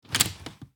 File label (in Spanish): Cerrar Puerta